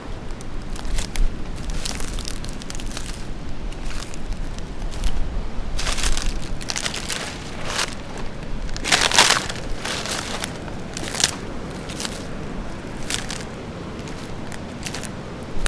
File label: sabal palm crisp crunch underfoot